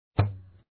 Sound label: Bow